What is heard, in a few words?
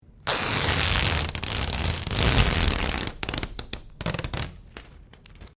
Sticky Tape Rip; common tape; Offices